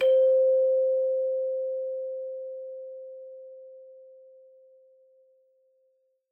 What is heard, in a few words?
sus C4, Hand Chimes, Struck Idiophones